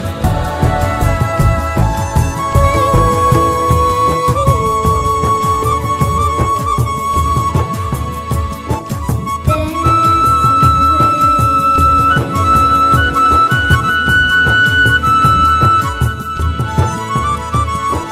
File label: instrumental music ringtone